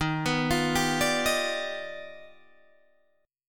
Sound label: D sharp Augmented Major 9th D sharp Guitar Open D